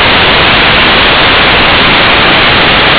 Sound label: WhiteNoise